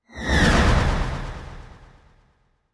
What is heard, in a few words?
landing; smite; kukulkan